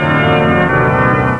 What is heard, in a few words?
terror organ; Odyssey